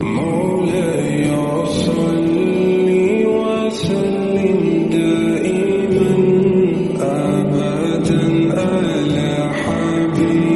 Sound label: slowed reverb